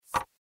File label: chip bet; poker game